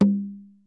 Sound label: CONGA LO, PERCUSSION; Crypton - DrumBase5000